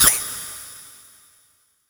SHORT, SHORTNOIS

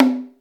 grugru; AKAI S6000 CD-ROM - Volume 5

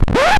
Up Scratch; Proteus 2000; Digital Sound Factory Proteus 2000

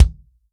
FUNK KIT, REAL DRUMS

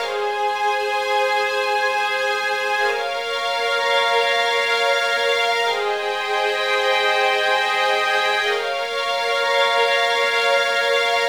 Strings, 70s Analog Funk

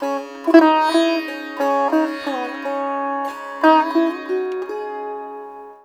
SITAR; Spectrasonics; Heart of Asia

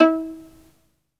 SOLO VI, Synclavier Strings; ILIO

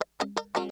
CHUCK KUH, FUNK CHOPS, guitar

